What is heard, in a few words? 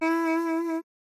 Pungi Note; tintin reporter cigars of the pharaoh